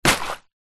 plastic bag full of water set down hard on wood surface; foley